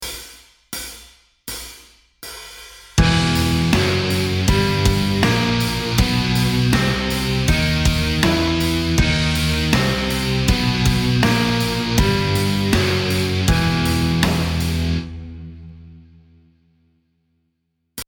Exotic Modes E Hungarian Gypsy Minor Relative G Major; metal music theory